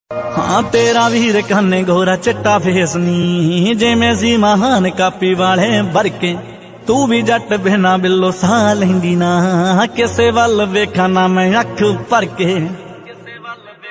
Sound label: punjabi song ringtone